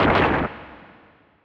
Rock Smash; Pokemon Solar Light & Lunar Dark 1.0.1

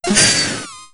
throw critical; Pokemon Solar Light & Lunar Dark 1.0.1

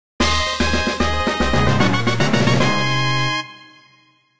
Evolution success; Pokemon Solar Light & Lunar Dark 1.0.1

